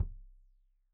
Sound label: Kik Stereo; Deeply Sampled Drums